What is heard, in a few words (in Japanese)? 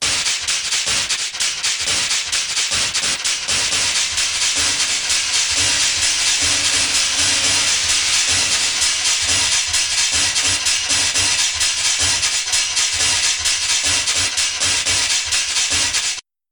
太いサウンドと効果的なリバーブ; ニコニコ動画